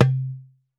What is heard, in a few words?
Tabla Dom; Roland BK-5; Percussion